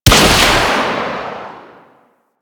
Arch Rocket Crossbow 3D Fire, Weapons; Warframe; Archwing

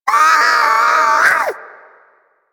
Sea Bird Tranquillized; Warframe; Hunting Critters; Ostron Sea Bird

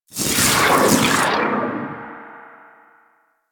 Slow Trap Avatar Enable; Warframe; Traps; Orokin

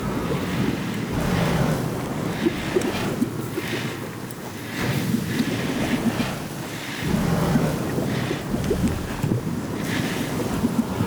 Amb Corrupted Smoke Geyser; Mendicant Area

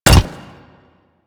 Pet Step Default; Warframe; Alad V Pet

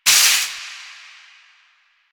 Shopping Cart Hit

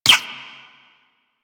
Ricochet; Warframe; Cowgirl

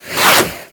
Boomerang Whoosh

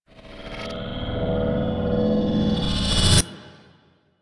Enter, Reverse Swells; DE; Warframe; Orokin

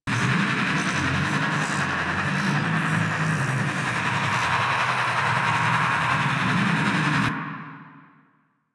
Portal Loop; Warframe; Chimera Prologue

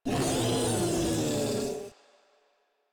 Infested Ancient Vocal Attack; Warframe; Proto Infested

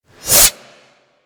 Light Glaive Fold A; Warframe

